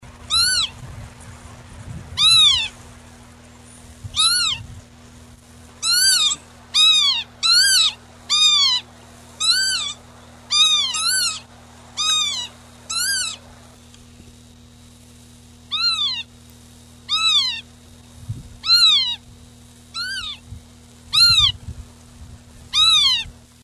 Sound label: Vanellus vanellus